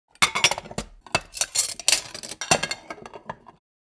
Kitchen furniture stacking plates

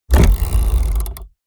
Squeaky industrial machine friction noise

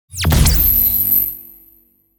EMP Shockwave glitchy electric

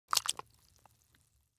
Aquatic frog jump and water splash

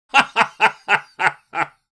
Elderly man laughing

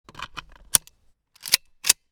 Bolt rifle reload; assault rifle

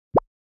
Cartoon button

